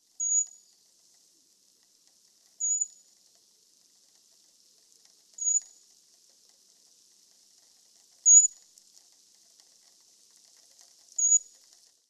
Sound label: Bar tailed Treecreeper Certhia himalayana, Ladakh